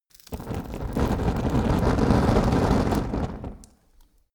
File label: fire torch flare heavy